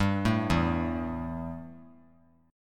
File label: E Minor Double Flat 5th E; arpeggiated; JGuitar